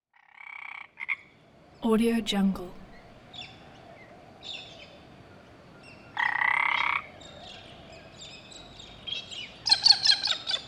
frogs in pond

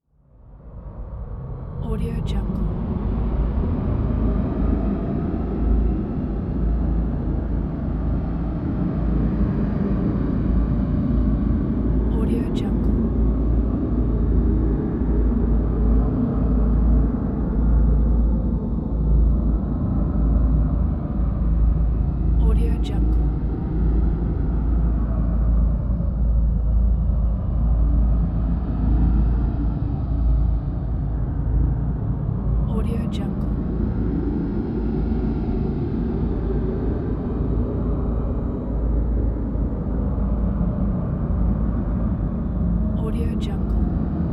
drone breath

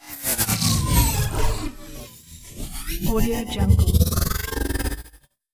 transforms synth whoosher mutation stutte; Robotic Lifeforms 2

